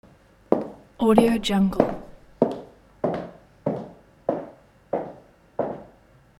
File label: footsteps on a wooden floor